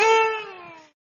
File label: springy ding